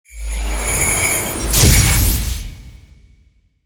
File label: BOSS Fabao Skill